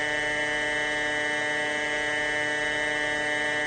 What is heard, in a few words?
tank turret